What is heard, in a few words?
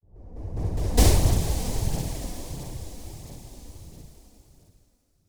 bang